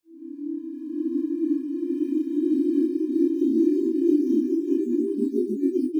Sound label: grain